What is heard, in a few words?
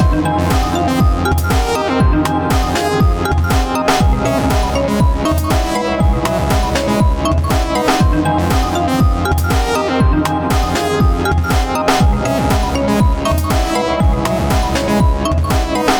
seamless tune